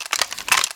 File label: item respawn